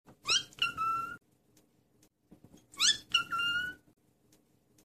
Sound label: Bird